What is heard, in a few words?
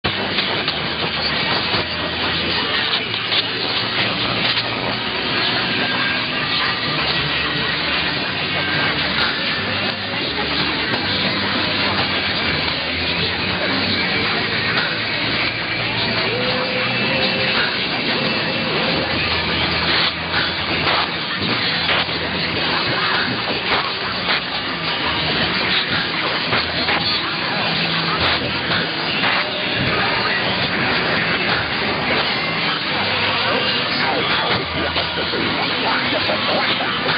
Hungary w folk music